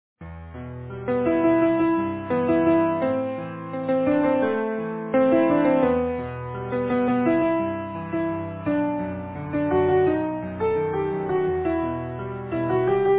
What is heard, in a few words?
Easy Piano